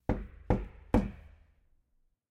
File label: Door knock Light; silent hill homecoming 2008 gamerip pc ps3 x360